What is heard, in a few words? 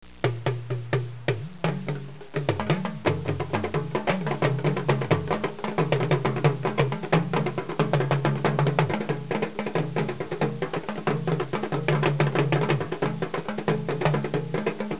drums